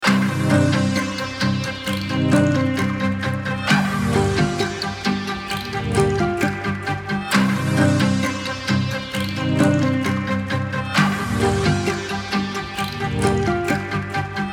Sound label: high fly loop